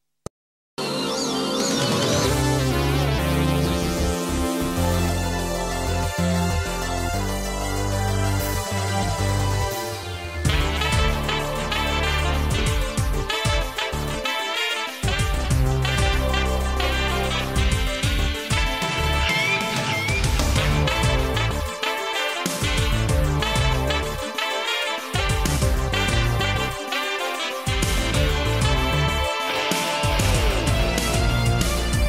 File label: Saxophone, Chiptune; Sega Saturn; Guardian Heroes